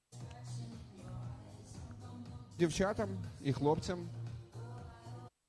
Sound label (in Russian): Банкетный Диджей, Караоке; Банкетный Диджей в Одессе